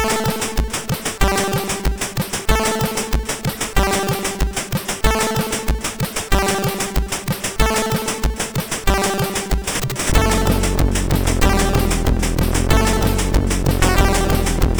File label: Dirty Bass, MOS6581R4; hvsc